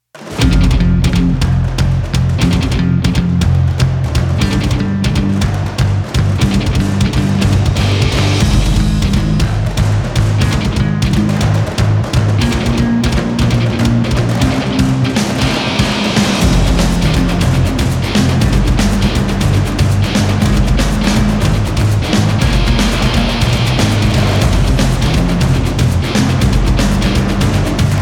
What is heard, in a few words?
Band Only; Gothic Storm Music